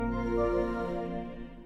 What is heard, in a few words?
ps5 logout